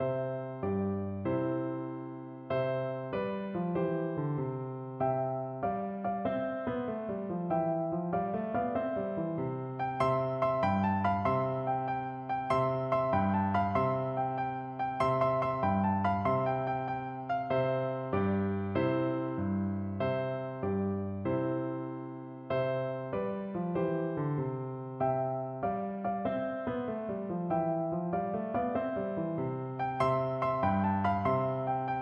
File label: three blind mice, piano